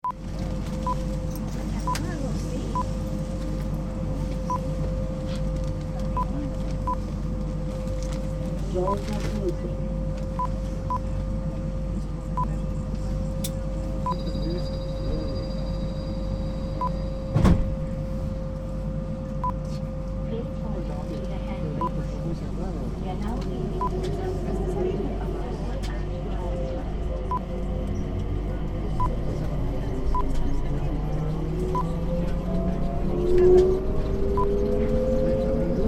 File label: Skytrain to Terminal; Terminal 3